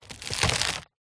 wood board large stress break splinter; foley

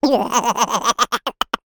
cartoon character laugh chuckle; zapsplat

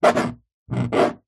foley glass bobbled scratch fingernail; zapsplat

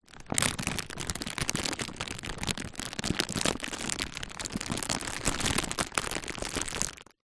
plastic packet bag movements inside perspective; foley

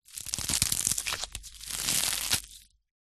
food ice pop frozen bend squeeze; zapsplat

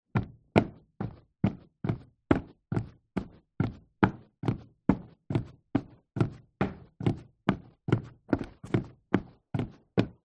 foley footsteps wood decking boots walking; zapsplat

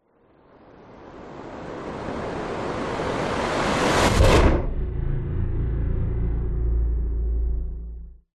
sound design whoosh impact cinematic heavy; zapsplat

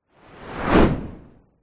whoosh fast; sound design

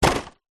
food plastic meringue case packet set down; zapsplat

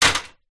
industrial step ladder metal set down on feet

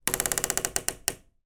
door wooden creak old loud fast

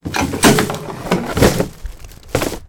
plastic grocery trays stack x3; foley

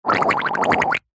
human fart wet dribbly poop; zapsplat

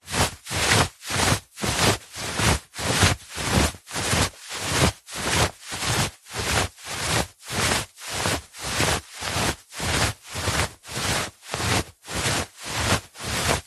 foley clothing movement shorts baggy walking slow; zapsplat

